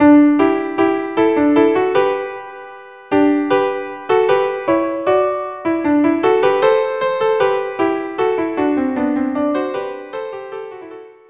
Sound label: violin duo